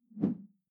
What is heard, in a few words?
whoosh simple short tonal low; swooshes-swishes